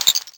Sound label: Chirp